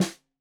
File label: Snare 65 Reg Stereo, Deeply Sampled Drums